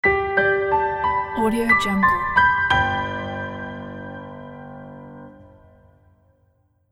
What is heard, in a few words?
concise piano logo